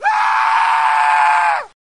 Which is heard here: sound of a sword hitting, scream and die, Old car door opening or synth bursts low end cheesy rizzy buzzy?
scream and die